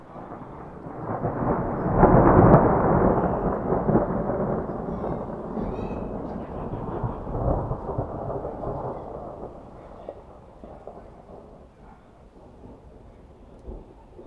field thunder spring, Beautiful rainy spring night, enchanting us by some great thunders and rumbles; soundscapes